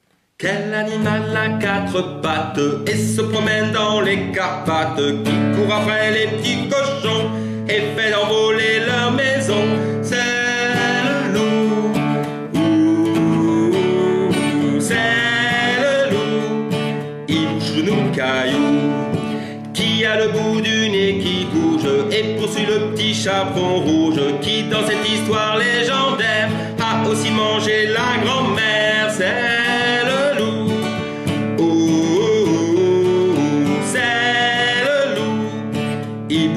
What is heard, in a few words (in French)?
guitare voix